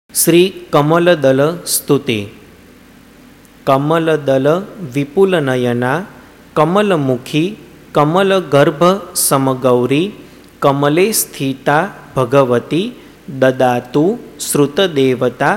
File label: Kamala Dala Stuti